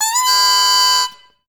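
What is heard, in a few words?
english horn; Best Service; Peter Siedlaczek's Advanced Orchestra; EH FX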